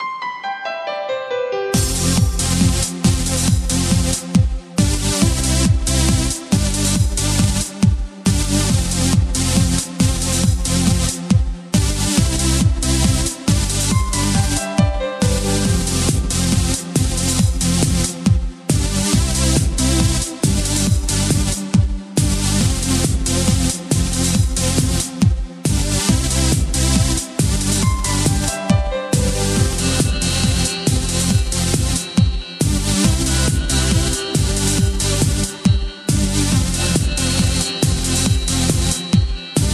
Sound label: fitness beat; 138 BPM